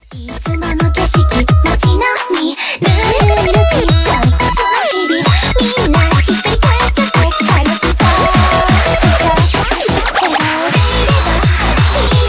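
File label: background music crossfade; Invaxion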